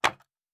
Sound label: Wood Impact; Fantasy Sounds Bundle; Craft